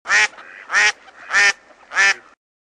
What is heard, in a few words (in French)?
Canard